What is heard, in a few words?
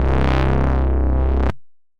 Square, Analog Bass; Square Dance; Ableton; Factory Packs; Retro Synths; Analog Bass Vol 1